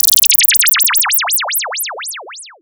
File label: SYNTH FX